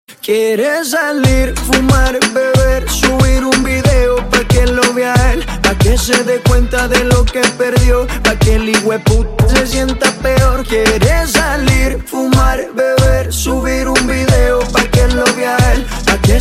Moody Ringtone

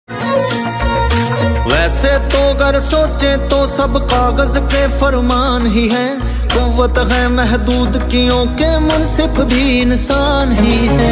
Jatt Punjabi Ringtone

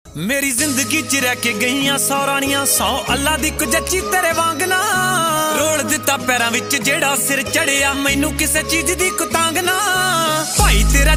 DJ Punjabi Ringtone

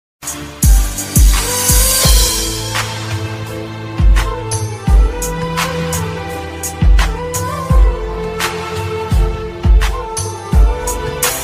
Heart Touching Bgm Tamil; Moonu 3

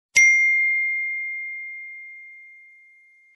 Bell Sound; Apple 13 Pro Max

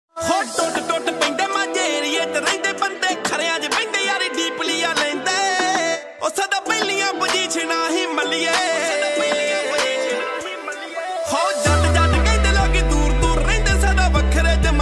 DJ Punjabi Ringtone

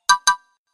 Drop Ringtone

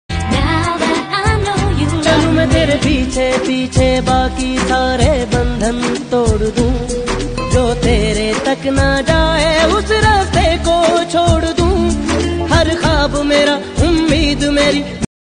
Ringtone

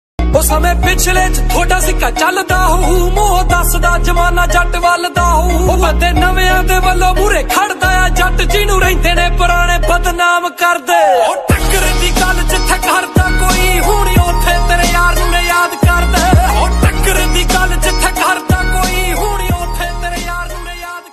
Punjabi Ringtone

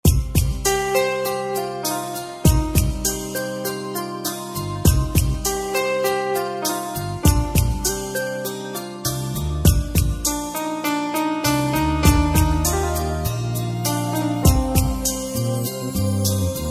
Clock BGM Telugu Ringtone

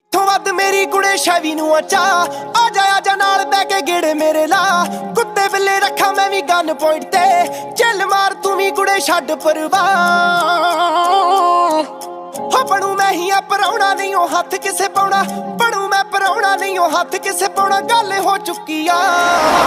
DJ Punjabi Ringtone

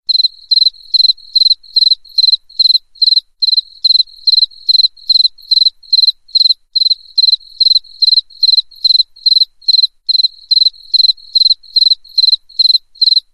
Cricket In Night Ringtone

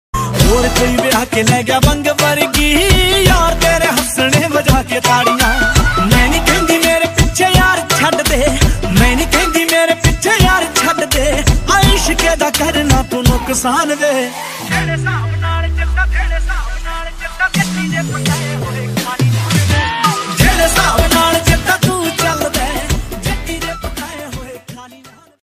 Punjabi Ringtone

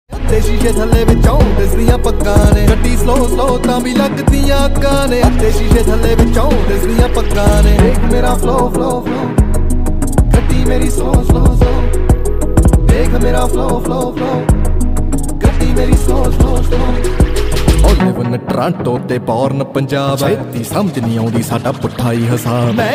Punjabi Beats

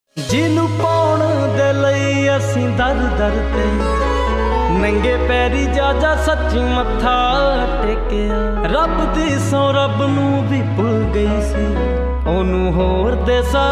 Dance Punjabi Ringtone

